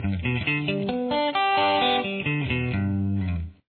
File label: E style arpeggio, guitar